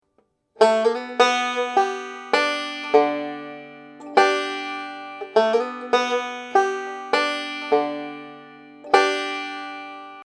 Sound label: banjo licks